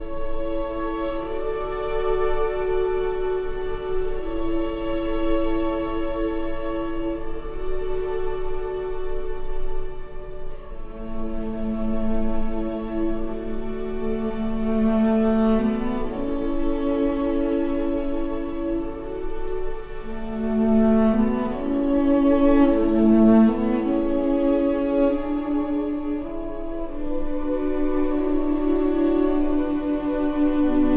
for cello and strings; Archi di Santa Cecilia

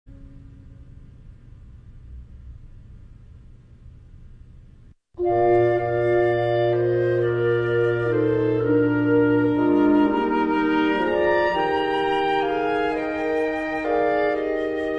Woodwind Quintet; Messiah; He shall feed His flock

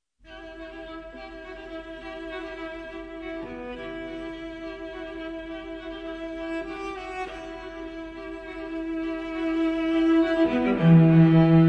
String Quartet; Carol of the Bells

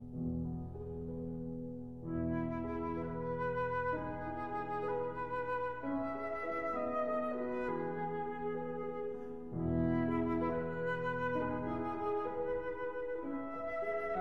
Berceuse (Lullaby) for flute and piano

